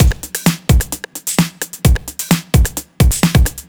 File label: 808 Full, Drum Loops 130bpm; Zero-G; Soundclash Nu Dance Electro; Acidized Wavs; 808 Land